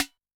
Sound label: Ana Da Rim, Yamaha DTXpress-II snare